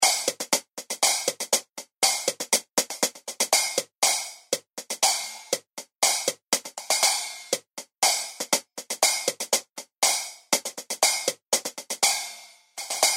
Zildjian K Custom 10 Inch Splash Hi Hat Loops

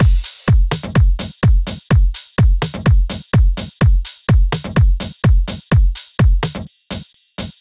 Drum Original

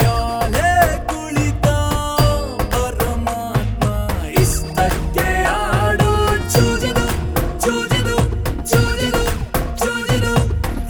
Bangra

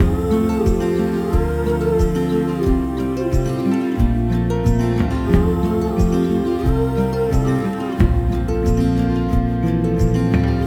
Chilled

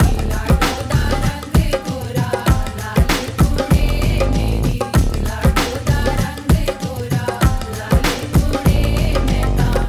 Punjabi

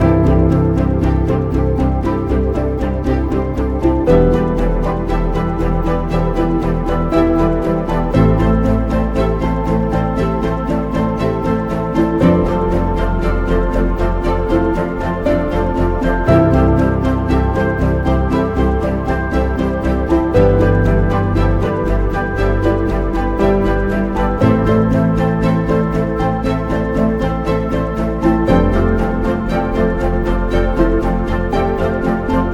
Film

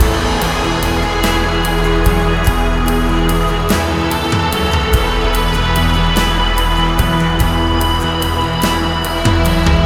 Rock

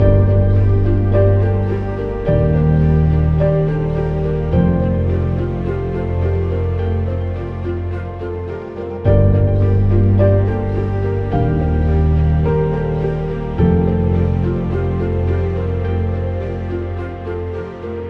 Orchestral

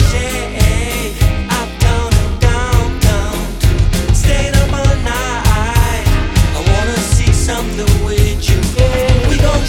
Alt Rock; Dolby

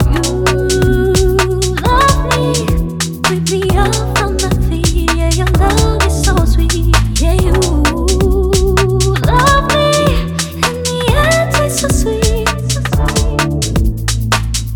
Garage Original

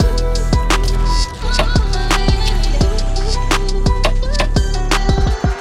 Trap

